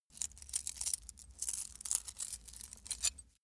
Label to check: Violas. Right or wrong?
Wrong